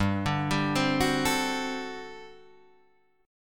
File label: G Major 7th Suspended 2nd Suspended 4th G down Guitar Drop D